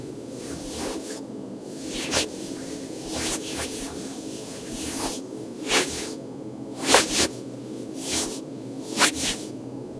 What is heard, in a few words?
gently wiping, delicate